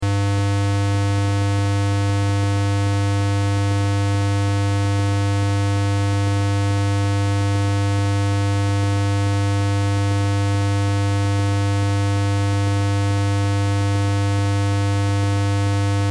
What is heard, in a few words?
Test Tone Square; Wave 100Hz 60 Secs -18Db Loop